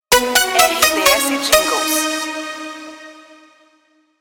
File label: theme news ID